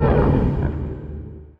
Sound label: hit; Monster; mell